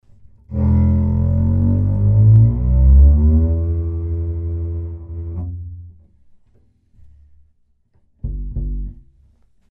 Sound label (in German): Kontrabass